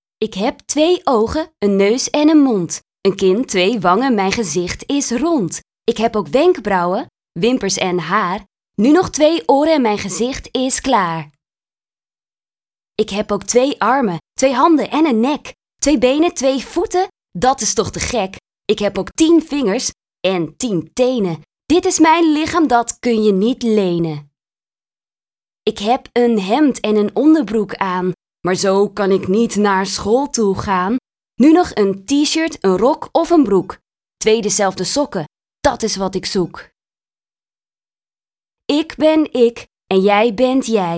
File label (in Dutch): Dit ben ik rap